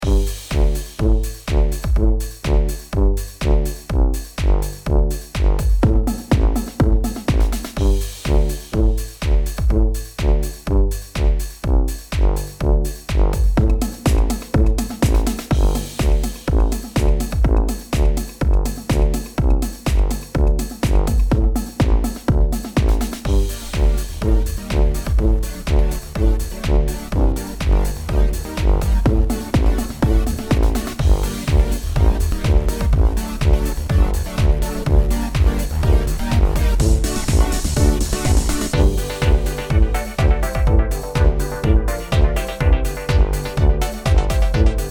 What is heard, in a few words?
Oompah music